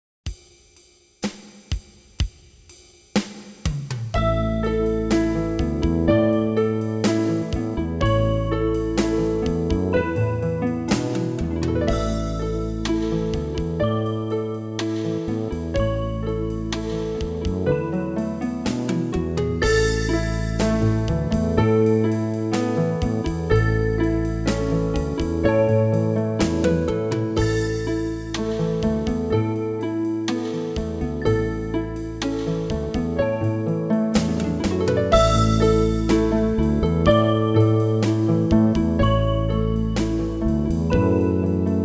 New Age Sounds Slow

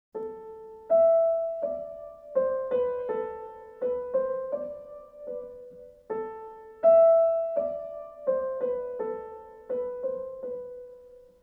Concert Sonatina Andante piano

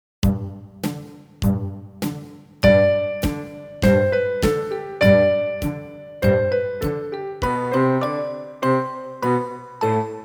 Musette Slow

